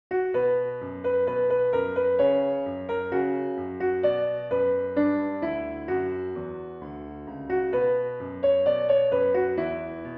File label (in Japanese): ピアノ